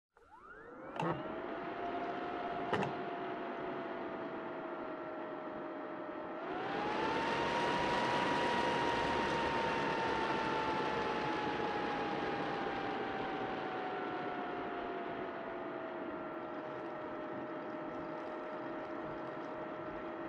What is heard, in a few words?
PC Operating Noise On Off